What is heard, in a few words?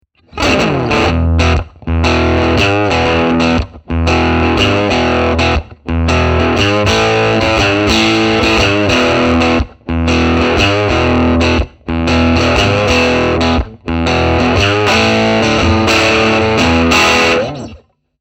Rock n Roll 57 Deluxe; fender